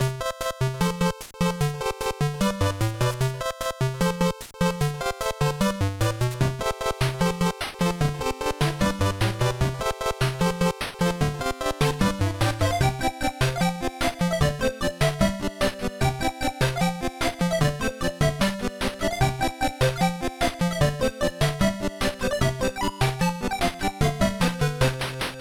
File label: level rain